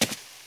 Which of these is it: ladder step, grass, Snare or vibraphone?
ladder step